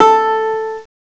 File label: PIZZ HI, HISTRING; CMI Sound Library Rev 1.3